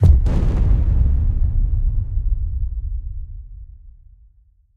Explosion Rumble Distorted, Sci-Fi Weapons; Cyberpunk Arsenal; Shapeforms Audio

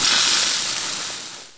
bullsquid acid; hl2

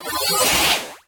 alien interface sci fi transition; BluezoneCorp

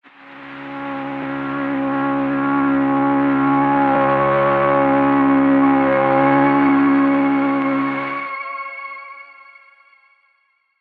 Lo-Tech one shot atmosphere C#maj, Degraded Tape; Lo-Tech Premium Degraded Tape FX

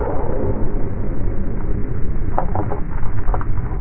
ambience; hl2; kraken

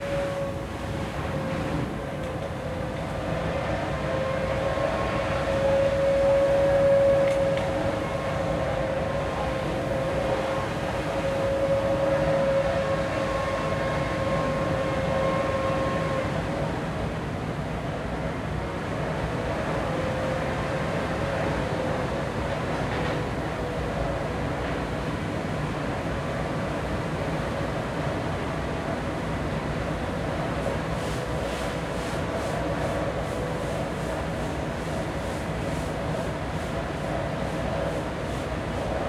Construction, UK Construction Ambience; InMotionAudio

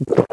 barnacle gulp; hl2

